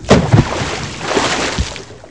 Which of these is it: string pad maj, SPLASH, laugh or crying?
SPLASH